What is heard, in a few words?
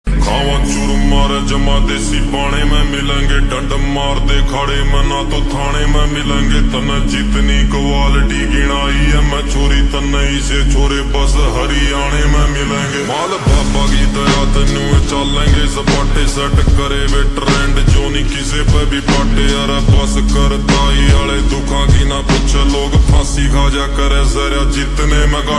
Ringtone Slow Reverb